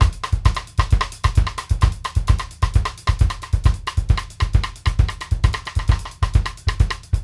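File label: X-STICKS SAM, Brazil 132; Sampleheads; New York City Drumworks VOL-1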